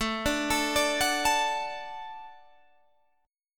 Gb Minor Sharp 5th A

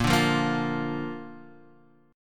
A sharp Major, Bb; chord

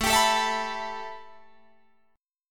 A 7th Suspended 2nd Guitar Drop D

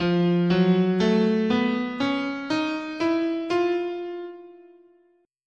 F Enigmatic, scale

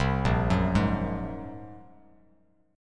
Bb 7th Suspended 2nd Sharp 5th Bb, Bass Standard; Bb7sus2#5; arpeggiated; 8,1,4,1; JGuitar

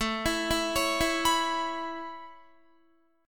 A Major Add 9th A Guitar, chord; Aadd9 arpeggiated

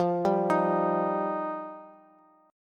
F 7th Suspended 2nd, Bass; arpeggiated; chord; F7sus2; JGuitar

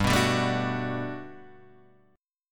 G Major 9th, Guitar, Standard; GM9 strummed; JGuitar

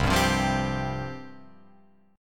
C Suspended 4th C, Guitar Open F; Csus4 strummed; JGuitar

